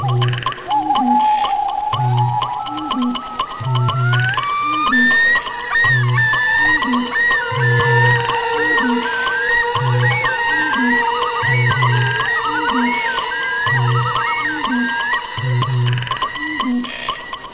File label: woodpecker loop; OctaMED SoundStudio V1; animals